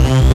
BAS; Best Service Gigapack 2; DanceWild

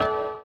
orient, bizarre guitar; Spectrasonics

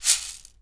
GANZA NORMAL, BRAZIL

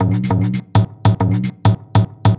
Frame Drum Beat, Acoustic Beats; Sonic Foundry (Sony Creative Software); Myths of Technology

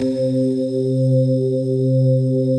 BELLY SYNS, SYNTHS; Pro-Rec - Synthsonic

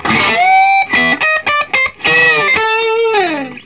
Vintage Blues Guitar, SOLO

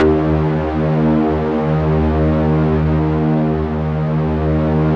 D#2, Yamaha VP-1; Music Store; 90's Sample CDs

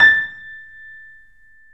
A, Boesendorfer, 3D Pianos; E-MU Producer Series Vol. 5; 3-D Audio Collection

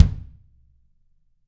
GRCH 18X2 R, Drums, TUCSON KIT; Big Fish Audio; Ross Garfield Drums VOL-2

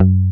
GUIT Mute F#1; Best Service Gigapack 2

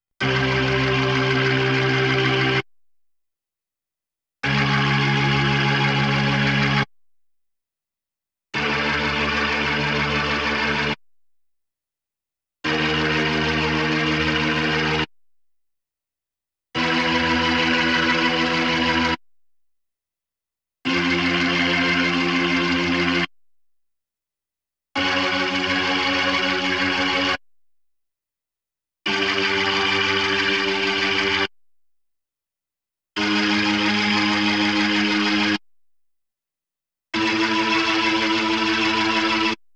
B3 Organ; Masterbits CLIMAX 8

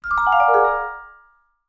SHRT GEST, vibe licks; Sampleheads - Dave Samuels Marimba & Vibes

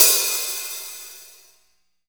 HH OP R, Drum 1 Dry; East Collexion

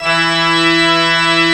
pipe, pipe organs; Greytsounds VOL-1

